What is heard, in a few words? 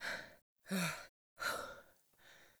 Sigh Breath; Garren Heal Part Two; Deora; Garren Boss Arena